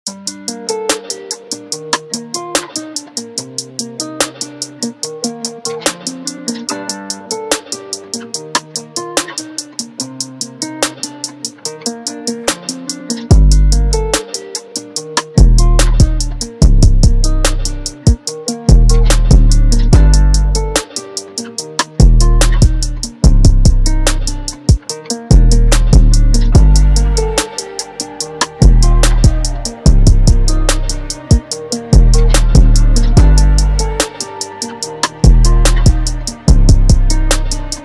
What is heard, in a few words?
instrumental